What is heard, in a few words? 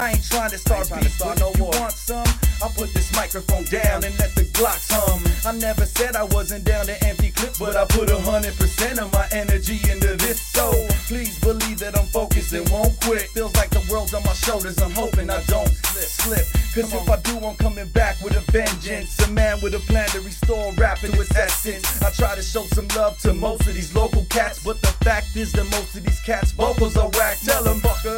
Glocks Humm